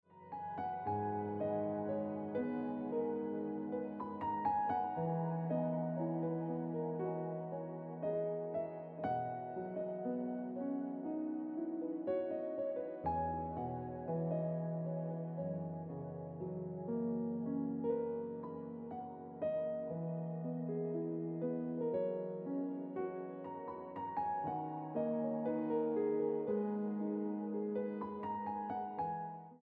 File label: Piano Music